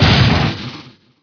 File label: Gun